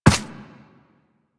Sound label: Run Ice, Footsteps; Warframe; Operator